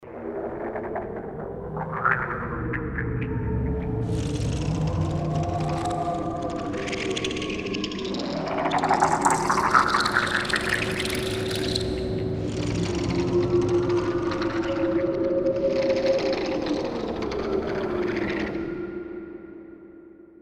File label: fx underwater, Roland JV880